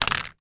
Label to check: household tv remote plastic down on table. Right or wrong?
Right